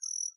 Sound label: Night Cricket